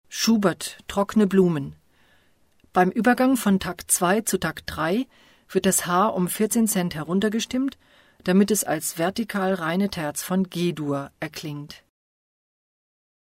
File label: tone repetitions as micro second intervals; schubert